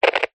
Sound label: technology CB radio short static click